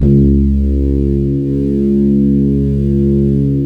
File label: C#, Synclav FM, BRASS; Big Fish Audio